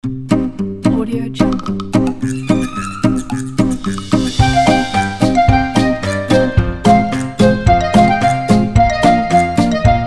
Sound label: fun halloween